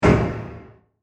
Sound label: Horror Trapdoor Closes; Creepy Door Sound Effects